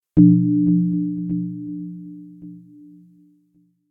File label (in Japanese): kehai